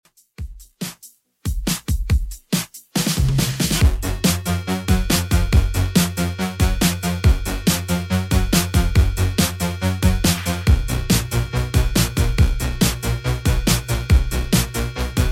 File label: Top40 Redrum 140bpm CLEAN